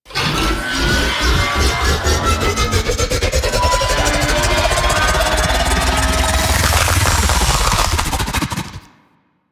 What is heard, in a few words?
Pit Spiked Object Break Free; Warframe; Infested